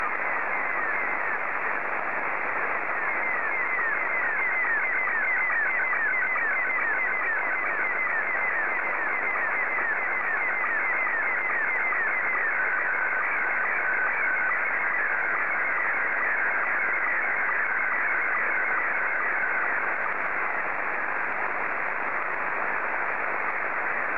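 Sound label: SSTV, Scottie 2; JA3OEN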